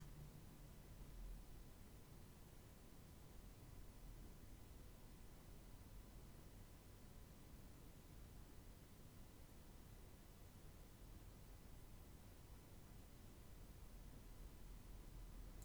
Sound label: BG NOISE